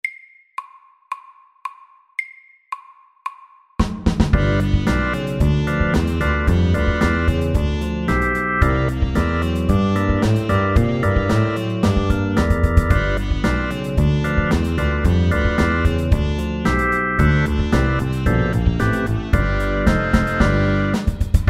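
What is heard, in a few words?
8 bar blues, fast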